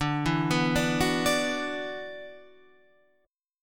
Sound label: E 7th Suspended 2nd, D, chord